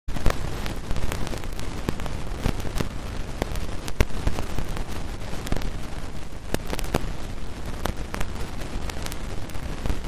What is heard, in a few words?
riverside; Kyoto U; Ashiu